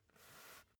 basketball turn swipe short

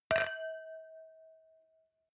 wine glass similar clink bounce hard; soundpool

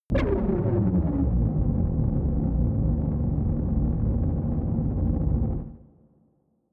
space huge dark shuttle; raumschiff

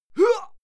male fight attack hit; soundpool

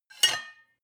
cutlery take from plate slide hard; berufe; gastronomie; soundpool

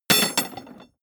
metal tools drop; berufe; handwerker; soundpool

